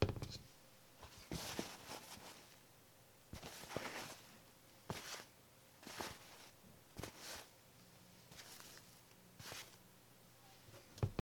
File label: Cloth Russells